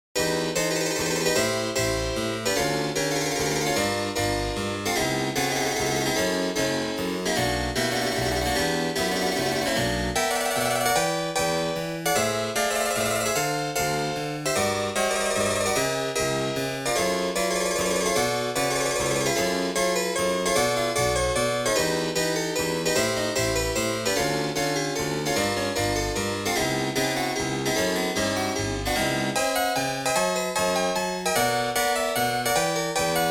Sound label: 21edo Chacony Harpsichord